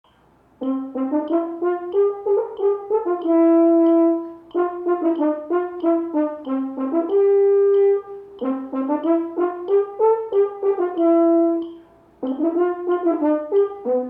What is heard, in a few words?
horn dance